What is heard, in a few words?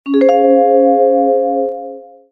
Xylophone, start up melodies; Milestone 312